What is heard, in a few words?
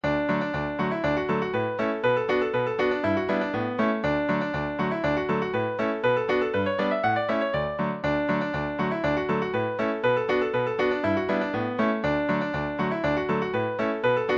scottish hornpipe, session tune midi